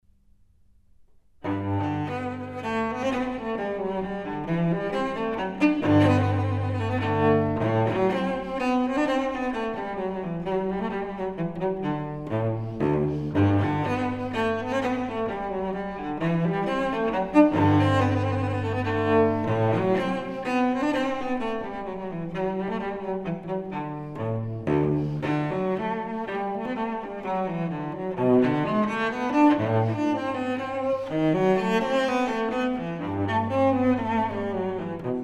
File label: Suite for solo cello No. 1 in G; Bach; Cello Suites